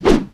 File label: swing miss